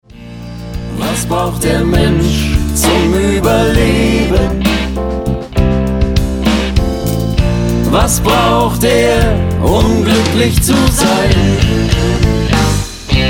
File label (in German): Toskana Blues